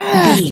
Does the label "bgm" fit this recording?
No